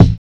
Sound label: deep kick single hit d key